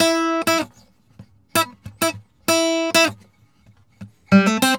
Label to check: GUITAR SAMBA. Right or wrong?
Right